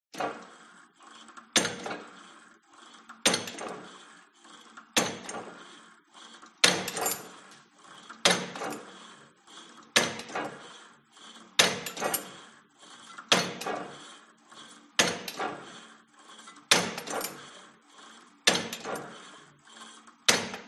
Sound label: SPORT GYM Cable Machine Pull And Drop Full Sequence Long; smartsound